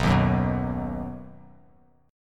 B Major, Bass Standard; strummed; JGuitar

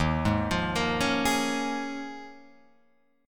Eb 9th Suspended 4th, Guitar, Open F; Eb9sus4; arpeggiated; 3,3,5,5,4,3; JGuitar

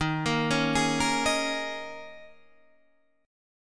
D sharp 7th Suspended 4th, Guitar, Standard; D#7sus4; 11,13,11,13,11,11; arpeggiated; capo=2

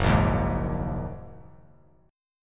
F sharp 6th Add 9th F sharp Bass Standard; strummed; JGuitar

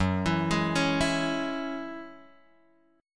F sharp 7th Suspended 2nd F sharp; F#7sus2 Chord {2 X 2 1 2 0} arpeggiated

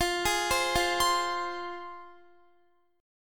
F Minor F down Guitar; arpeggiated; tuning 4E,4A,5D,5G,5C,6F

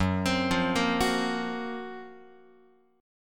F sharp Major Sharp 11th F sharp, Guitar; F#M#11 Chord; arpeggiated; x,2,3,3,2,5

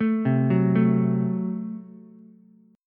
Gb Diminished, Ukulele, Gbdim chord; arpeggiated; JGuitar

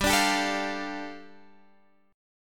G 7th Suspended 2nd G, Guitar Drop D; x,10,12,12,10,13; down

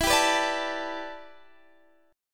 E Minor 11th guitar chord, Open D

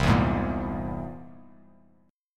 C Augmented 7th, Bass Standard, chord; strummed; JGuitar

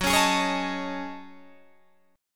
Gb Minor 6th Add 9th Gb down Guitar Drop D

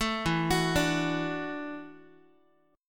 E 7th Suspended 2nd Suspended 4th, down, Guitar; E7sus2sus4; arpeggiated

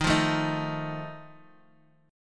Eb Suspended 2nd Flat 5th Eb 4G 5C 5E 5A

